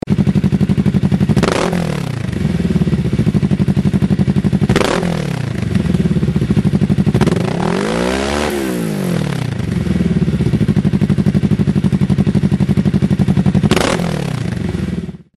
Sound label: Ninja 650R